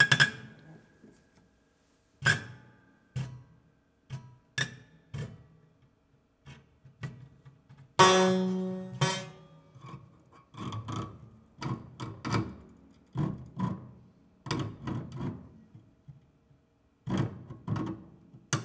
Plier Grind, Piano Destruction

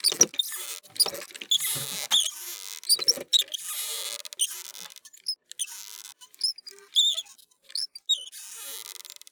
Sailing

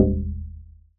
Plywood Sheet Soft Strike

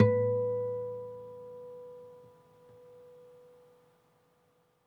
Harm, Classical Guitar

